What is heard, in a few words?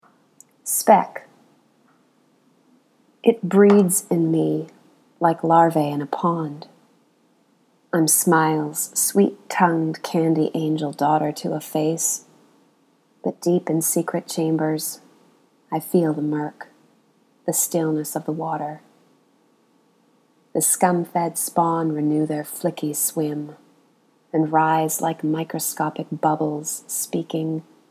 Funk